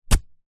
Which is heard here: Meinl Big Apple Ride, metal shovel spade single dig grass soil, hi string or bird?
metal shovel spade single dig grass soil